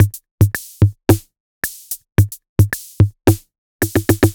retro drum machine Loops 110bpm; Tape B; MT40

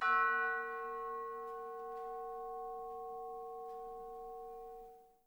F#4, Percussion & Harp; Miroslav Vitous

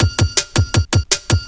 Bull Break 162

